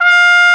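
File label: Trumpet mf F2; Digital Sound Factory Virtuoso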